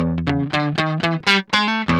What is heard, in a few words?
GUI, licks; 120 LICKS